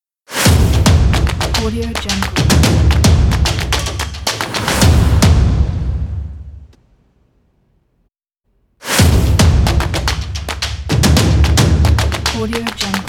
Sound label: cinematic stomp logo